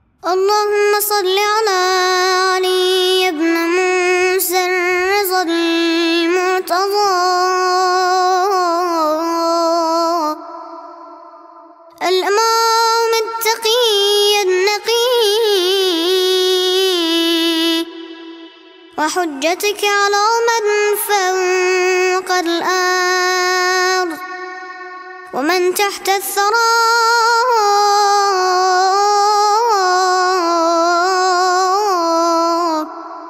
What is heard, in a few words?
صلوات امام رضا, Islamic Ringtones